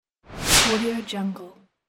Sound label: swish sound